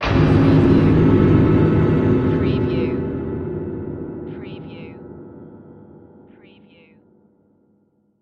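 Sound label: Production Element Hit Strings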